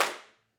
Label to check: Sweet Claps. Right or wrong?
Right